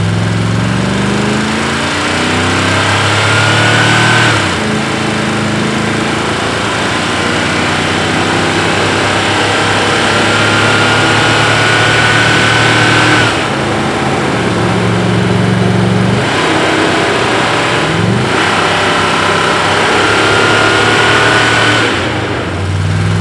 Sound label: suburban fast move loop; Call of Duty Modern Warfare